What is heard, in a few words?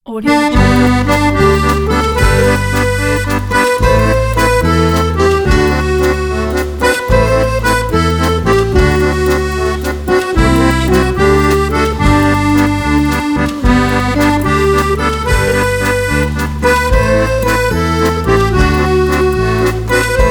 accordion